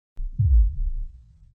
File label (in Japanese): 心臓